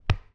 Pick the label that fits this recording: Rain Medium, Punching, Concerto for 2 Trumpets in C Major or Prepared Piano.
Punching